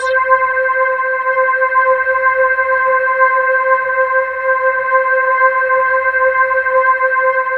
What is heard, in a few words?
VOX ORGAN; MORPHEUS; Syntec; Wall of Sounds